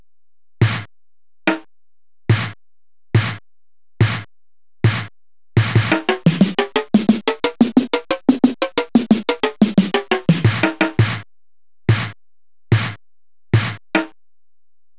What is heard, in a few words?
Elec Snare Rim, drumtraks